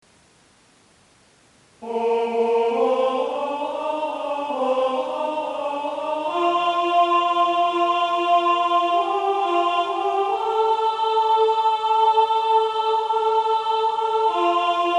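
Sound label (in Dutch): altstem; sintjanskoor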